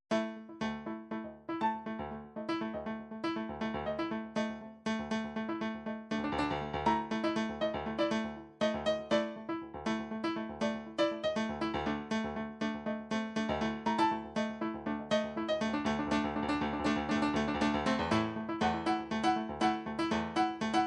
bouncing 130 bpm

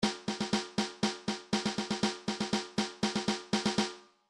individual drums snare drum, midi

